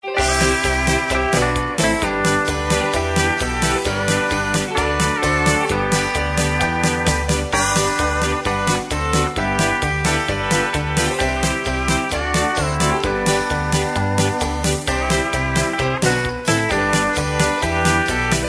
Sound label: music